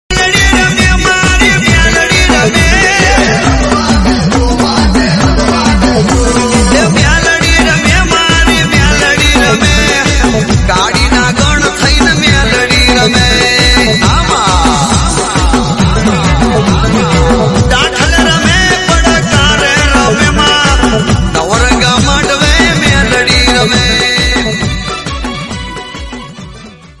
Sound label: devotional